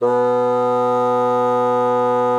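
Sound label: bassoon forte B2; Xsample VOL-6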